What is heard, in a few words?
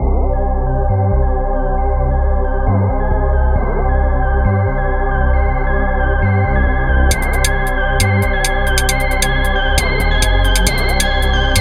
Dripping; trap chemical